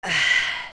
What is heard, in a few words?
Hot; Link moaning in the heat; Adult Link